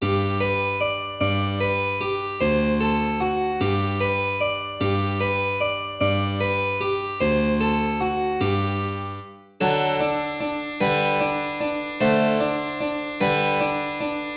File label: waltz; Carulli Ferdinando